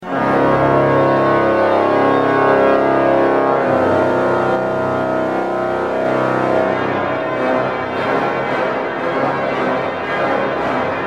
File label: improv, orgue